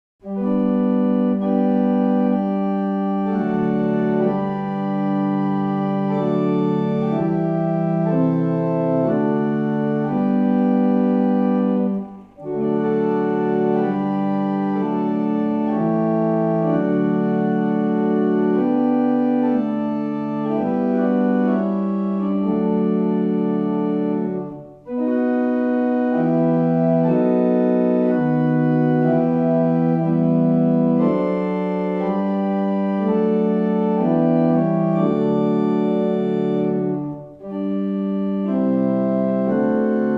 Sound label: barrel organ; Llanfair